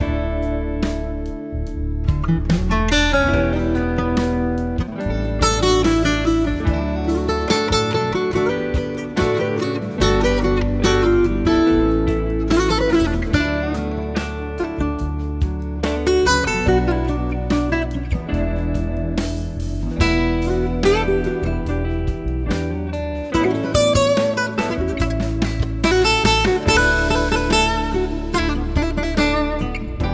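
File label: vibraphone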